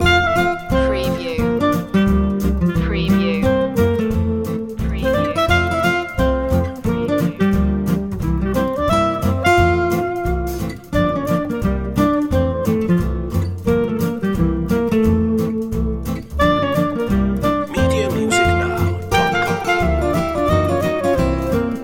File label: swing loop